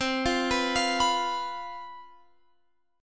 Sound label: C Major 7th Suspended 4th, down, Guitar, Open F; CM7sus4 arpeggiated